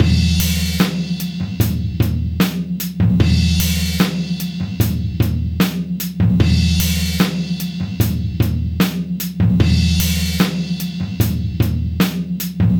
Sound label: 75 live sexy triphop, live breaks